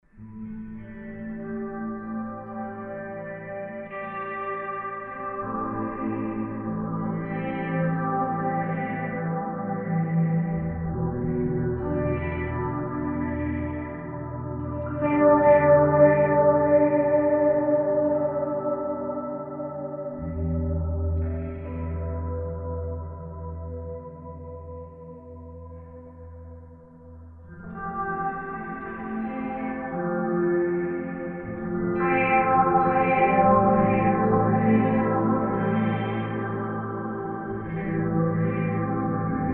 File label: ocean x2